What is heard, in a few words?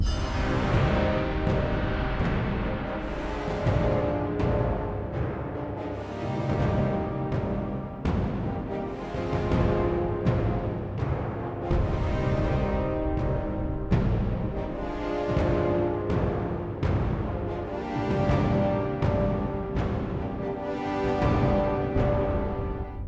Battle Marching Prepare